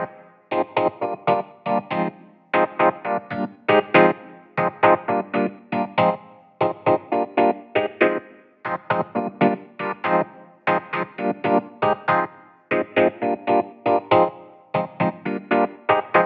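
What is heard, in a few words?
118 Keys Loops Cm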